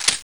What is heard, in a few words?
glock clip in, weapons; Counter-Strike Global Offensive; glock18